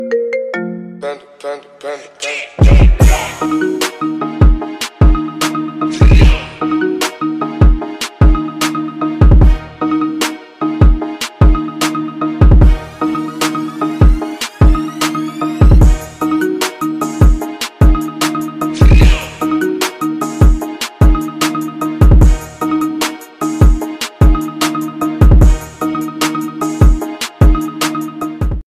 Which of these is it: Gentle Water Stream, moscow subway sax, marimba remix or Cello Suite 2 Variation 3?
marimba remix